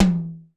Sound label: Percussion, Korg 01W; 300 Drum Machines